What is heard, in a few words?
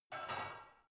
barbell only replace reverberant soft; sport freizeit; fitnessstudio